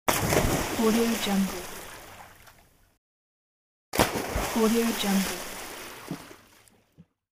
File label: jump into the water